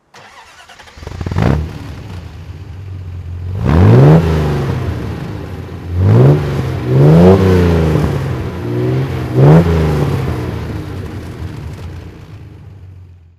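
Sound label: austin healey 100 6 rally throttle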